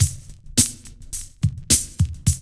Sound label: LOOP; E-Lab; X Static Goldmine 2